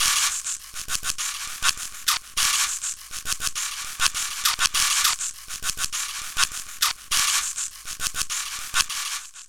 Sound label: Hard Shaker Beat, Electronica Electronic Beats